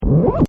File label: Record Scratch, Turntable; DJ; Musical; Partners In Rhyme